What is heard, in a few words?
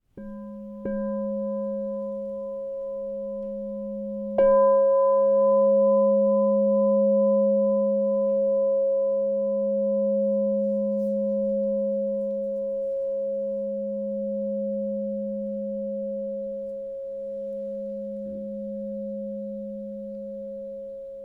Crystal Tones Singing Bowls